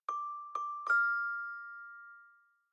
Light, notifications

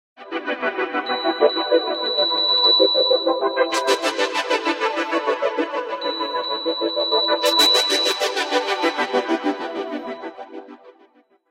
Sky-high, Ringtones; 7373 Southeast Asia; Nokia

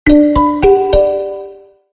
Consonance, notifications; Honor; X5

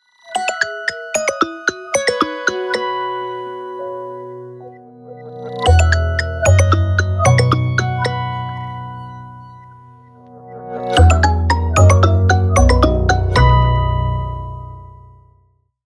Hybrid Guitar, Nokia Tones; Windows Phone apps

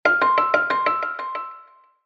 Tempering Email, Fixed Piano; HTC

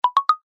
Scale, notifications; Infinix; XOS 10

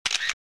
cam snap; LG; Optimus-Hub-E510

